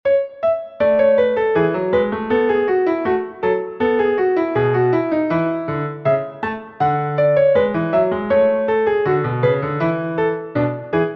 gavotte in a

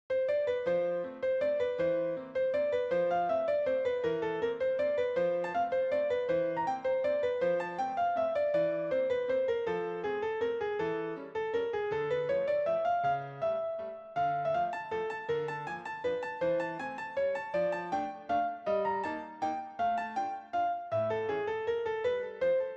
little waltz in f

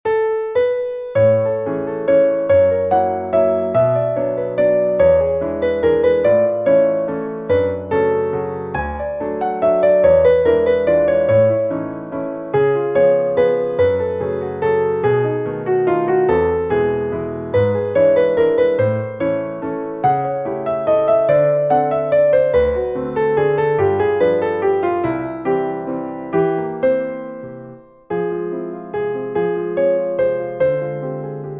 Waltz in A

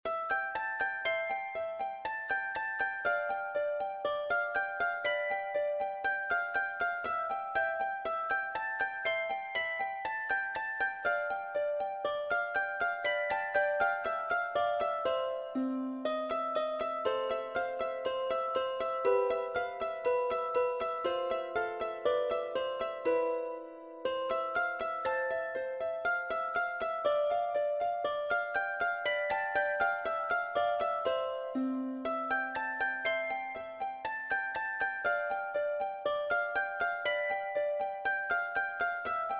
crystal music box

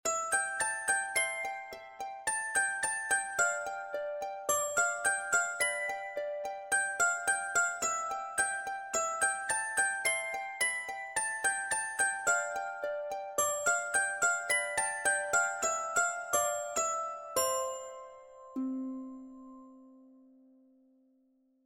crystal music box

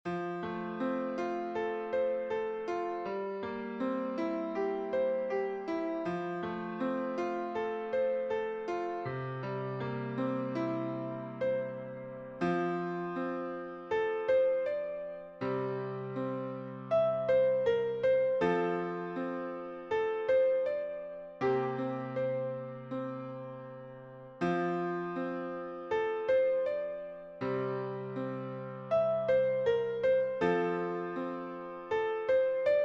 little concertino in c lullaby

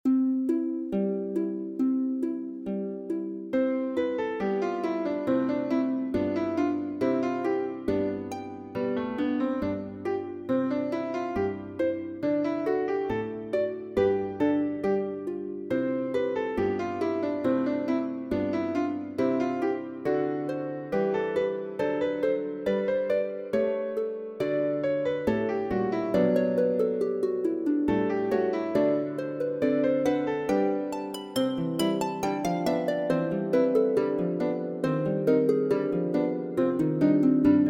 Concertino in C with harp; Little Concertino No 2

